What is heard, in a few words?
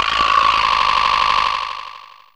Vbr Slap, Percussn; Fairlight CMI IIe